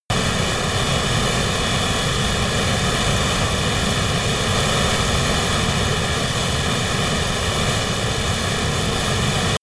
military jet a-10 thunderbolt turbine full power close